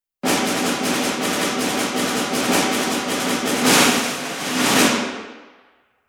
SD roll ex

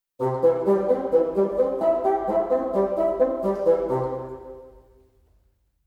arp

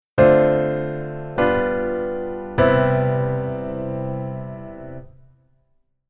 Keyboard Voicing Hi

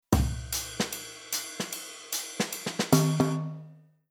Rhythm Modern Jazz

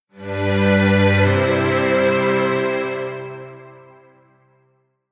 Strings Wasei

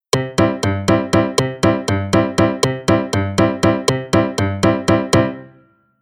Rhythm

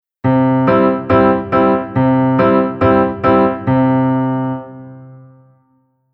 Piano Dur

